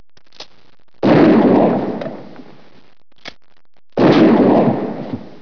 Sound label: shotgun